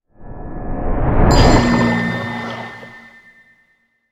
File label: death ascent; smite; god ganesha skin11